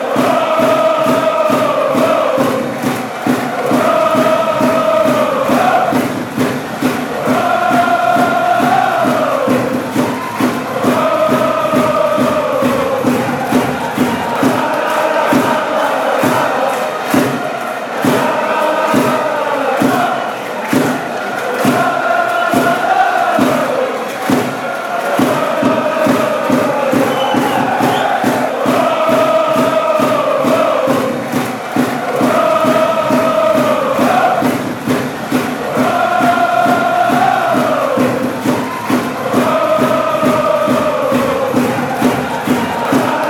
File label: cavese fanchants